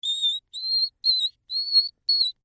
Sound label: Wood Creak Squeak, Foley, Wood, Creak, Squeak, Small; Objects; BlastWave